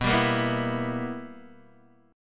B Major 7th Suspended 4th Sharp 5th Guitar DADGAD, chord